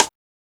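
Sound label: house rimshot; E-Lab; X Static Goldmine 1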